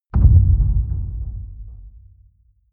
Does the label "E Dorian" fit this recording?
No